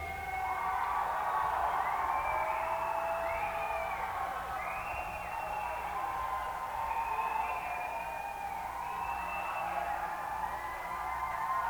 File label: Crowd; Concord, NH; The Upper, St Pauls School